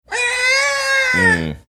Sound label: making baby crying screaming sound